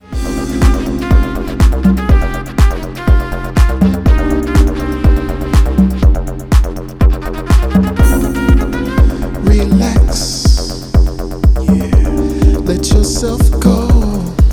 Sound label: Ambient Acid Mix